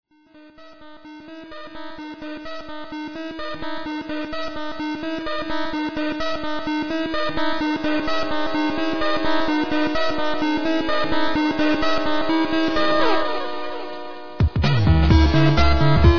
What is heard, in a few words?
song; sven